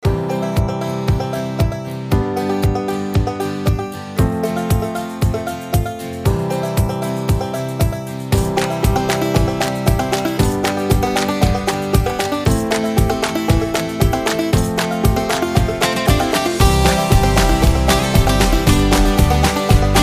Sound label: Banjo